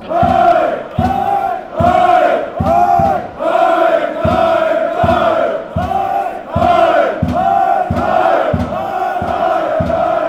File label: chant, aek athens